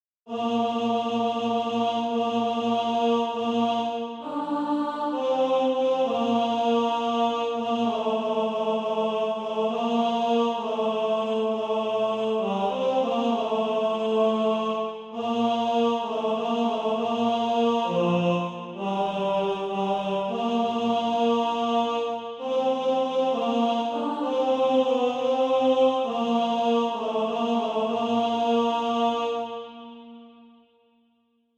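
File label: tenor, SATB Practise Music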